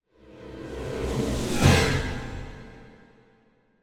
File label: Symphonic Hit Rising Surprise - Deeper; Hollywood Edge; sound designer's toolkit v2